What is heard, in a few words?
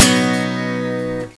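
guitar string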